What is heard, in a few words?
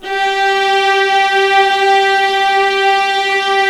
G2, Ultimate String Collection, VLAS EXP; LEG